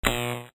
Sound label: zap